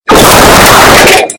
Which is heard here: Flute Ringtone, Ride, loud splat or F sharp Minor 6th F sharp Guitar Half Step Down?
loud splat